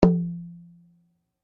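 Drum Flat Skin mezzo forte, Drum heads isolated from drum shell; Musical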